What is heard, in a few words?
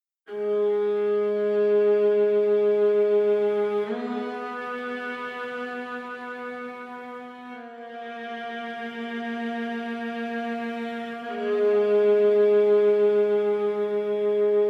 resurrected solo viola